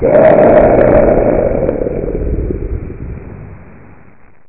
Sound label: exploshn; arcade